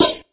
126 DRM, Disco House; USB Soundscan vol.20; Fresh Disco House I; AKAI; 126 BEAT

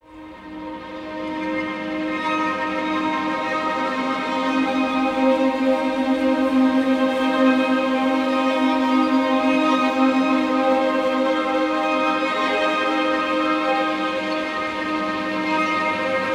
Chambergrid C minor

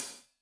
Hat Loose, Hi-Hat, Cymbals Stereo

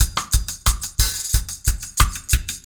RG90 DRUM, REGGAE

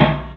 Loop, Chamberlin Rhythmate; 300 Drum Machines; Processed

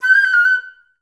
FL ORNAMI F#5; Best Service; Peter Siedlaczek's Advanced Orchestra

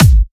Dubstep Kicks

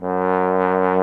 VIBRATO TROM

